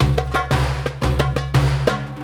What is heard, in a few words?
spingle Drum Loop; wild bazaar